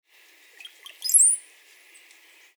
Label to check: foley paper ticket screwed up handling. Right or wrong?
Wrong